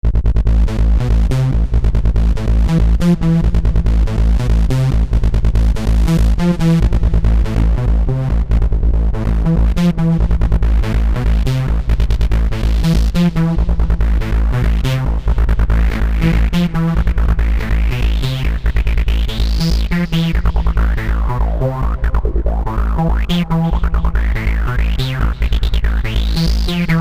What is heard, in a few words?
korg wavestation sr sounds